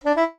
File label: jingles SAX; Kenney's Sound Pack; Music Jingles